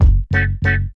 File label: Hip Hop R n B FX; USB Soundscan vol.19; AKAI